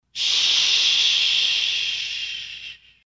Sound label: The Slow Burn